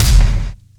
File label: acoustic bass drum sample f key, Royality free kick sound tuned to the F note. Loudest frequency: 129Hz